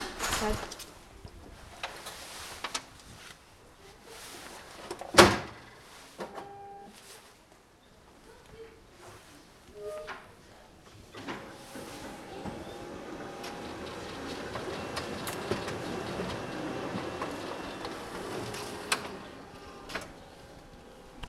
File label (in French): photocopieur; Les ecoles